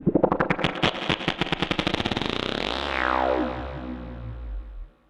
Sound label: Odd Modular; radiosonics